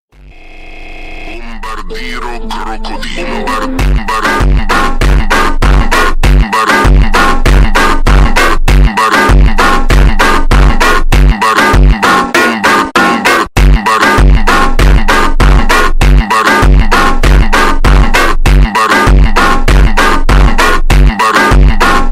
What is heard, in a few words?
BOMBARDIRO CROCODILO FUNK